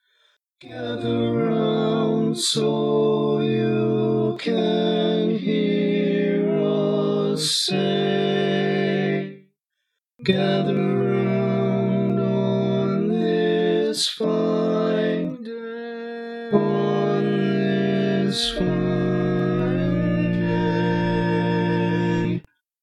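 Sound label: baritone, barbershop tag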